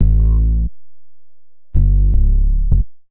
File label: Synth Bass